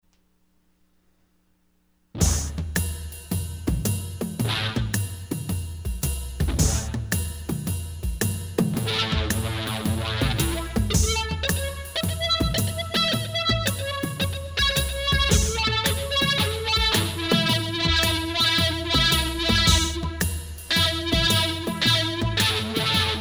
First Call Assembly

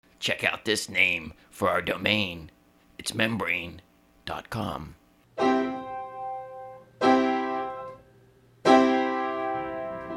something classical, songs written at the piano

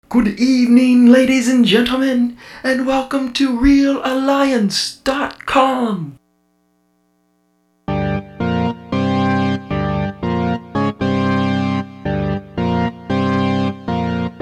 guitar

